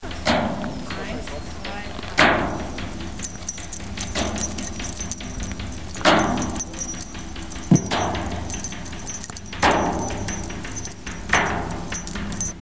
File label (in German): Space Sound